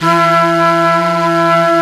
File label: Flute, Singin' Flute